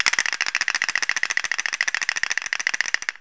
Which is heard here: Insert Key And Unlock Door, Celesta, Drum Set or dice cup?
dice cup